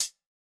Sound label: hihat